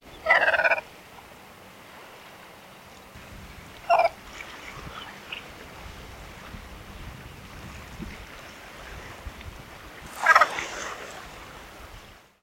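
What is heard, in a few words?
red-faced cormorant voice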